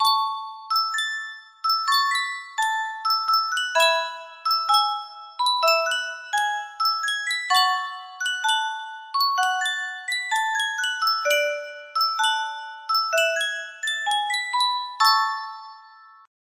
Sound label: yunsheng music box, music box melody